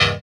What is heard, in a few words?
SYN, dance; Sound & Vision - Gigapack II